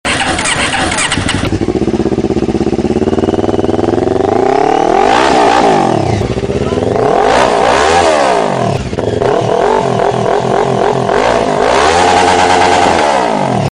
toque para celular

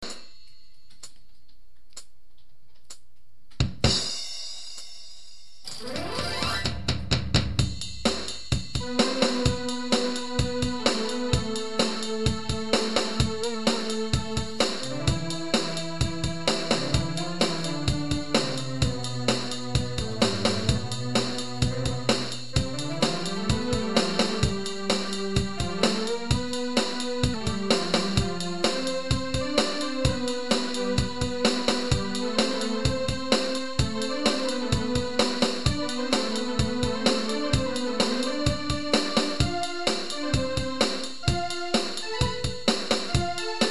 improvisando com synth

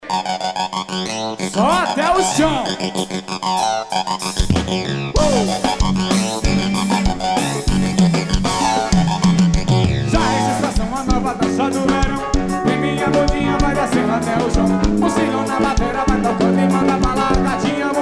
banda forro ao vivo; festa dor caminhoneiro de campo do brito se

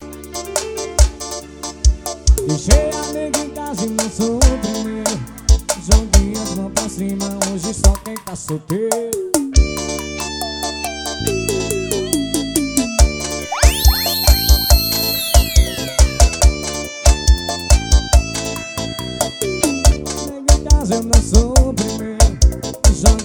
forro gravado ao vivo; guamare

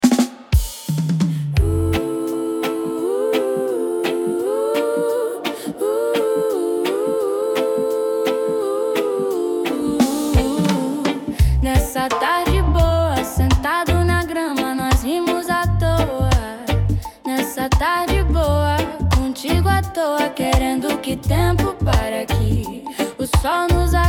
que tarde boa reggae

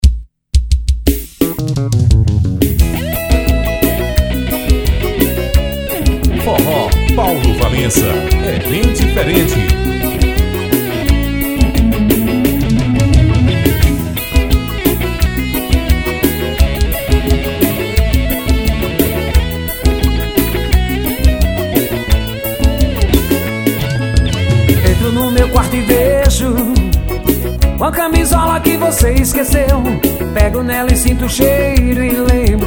forró

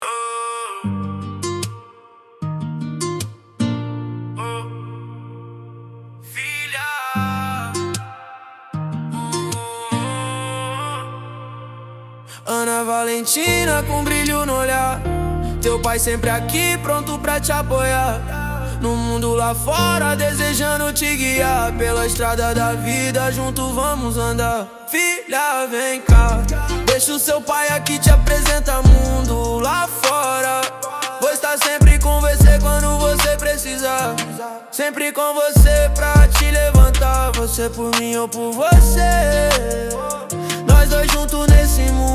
sertanejo

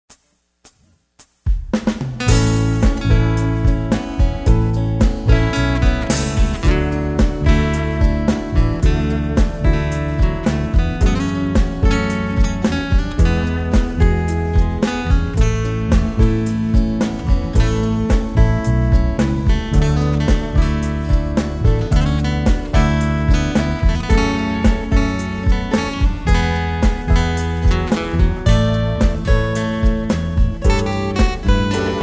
Gospel Vinheta

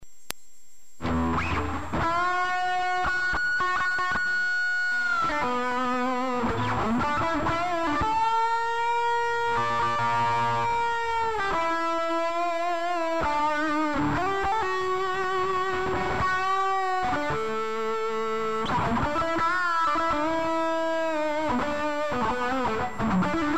Solo de Distortion

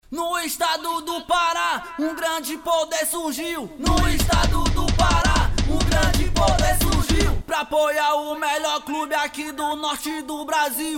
Grito De Guerra Da Torcida; Grito De Guerra Da Torcida Bicolor

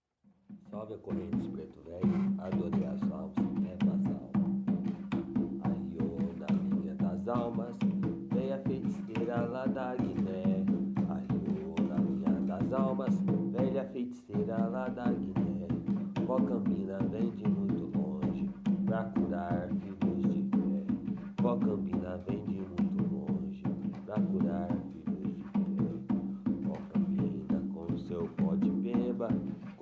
ponto cantado de preta velha; vovó cambinda; terreiro zé malandro

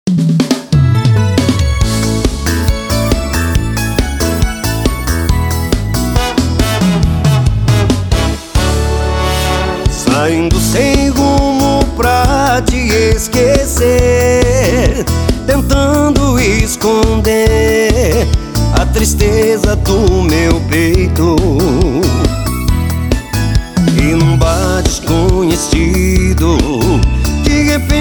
mesa de bar banda

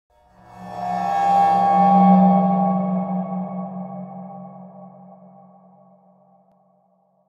efeito sonoro sons de cimbalo assustador

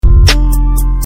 rap da educacao remix

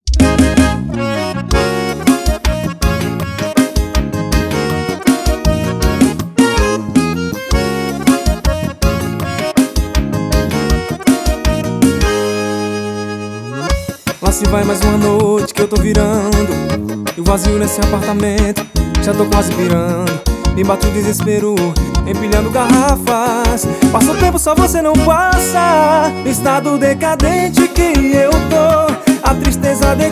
xote das antigas

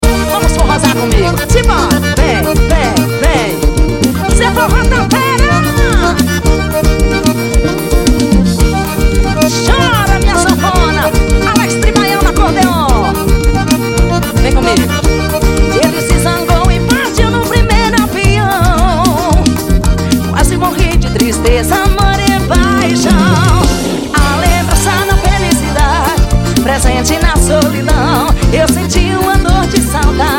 dor de saudade forro

